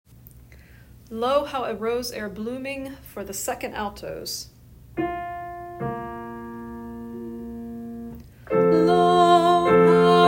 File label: alto